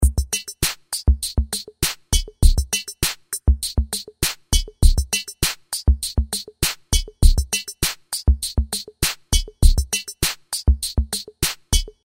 rhythm 80's classic slow theme; Sound Graph

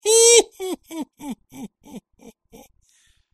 risa estupida stupid laugh

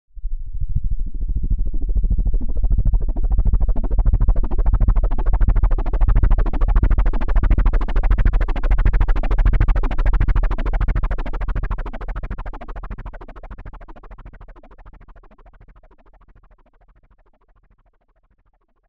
underwold noise; Sound-Graph

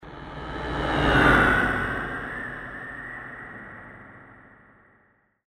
Spaceship dopler; Sound Graph

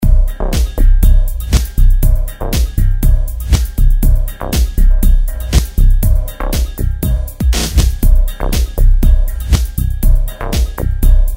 rhythm industrial; Sound Graph

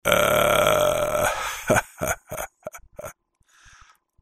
risa desganada Lethargic laugh

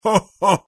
risa incredula incredulous laugh

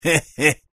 risa compromiso Laugh commitment

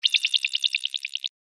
sellection button scfi; Sound-Graph

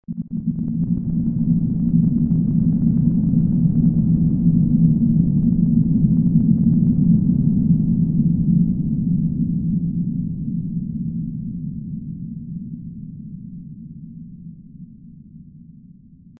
alien noise spaceship sound effect; Sound Graph

